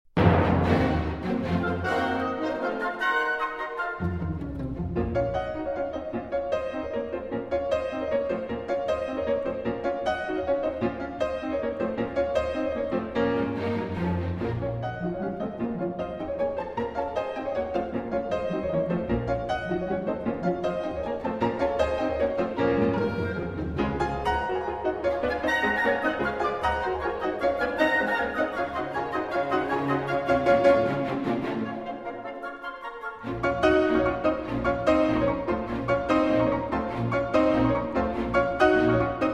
Piano Concerto No. 1 in B Flat Minor, Allegro con fuoco